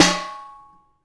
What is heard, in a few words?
armour hit; AoM-ARTofMyth